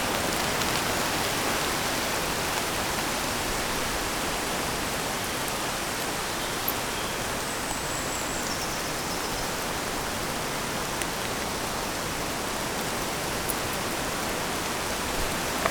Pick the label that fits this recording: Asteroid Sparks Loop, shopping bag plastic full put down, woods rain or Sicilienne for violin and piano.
woods rain